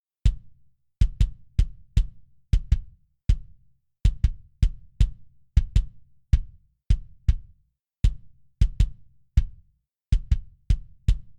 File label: kick eq